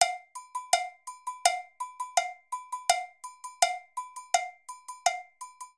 Rhumba Funk B Cowbell Agogo 166bpm, Latin Percussion; Ableton; Factory Packs